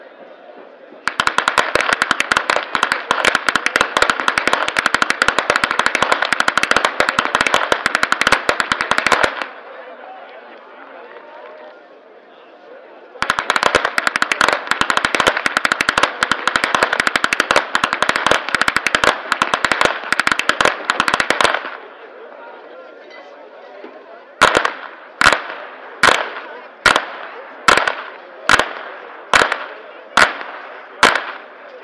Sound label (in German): schnalzen, flachgau